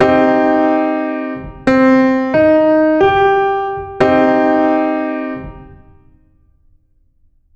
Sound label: C minor